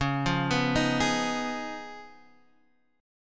Db 9th Db down Guitar Half Step Down

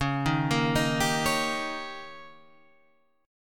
Eb 7th

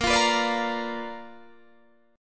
B Minor Major 7th Flat 5th A sharp

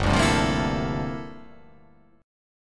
G Minor 13th G down; Gm13 strummed; JGuitar

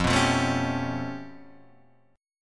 G 9th Flat 5th F

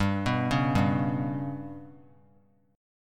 G Major Flat 5th chord; GMb5 arpeggiated; JGuitar

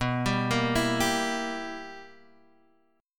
B Major 7th Suspended 4th Sharp 5th B down; arpeggiated; JGuitar

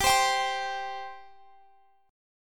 C 5th G

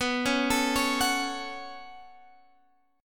B 7th Suspended 2nd down Guitar Standard, chord; B7sus2; arpeggiated; JGuitar

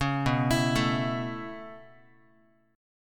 B 7th Suspended 2nd Suspended 4th B down Guitar; B7sus2sus4 arpeggiated; 4A,4E,5A,5D,5G#,6C#; chord shape; 4,7,7,7,x,x; JGuitar

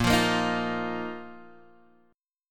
Bb Suspended 4th, Guitar, Open G

